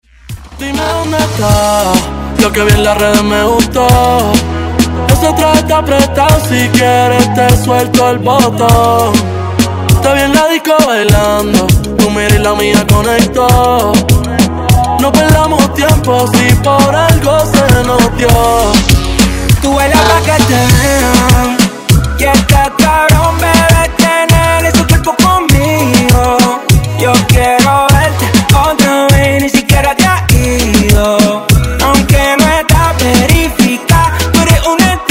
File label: Reggaeton Redrum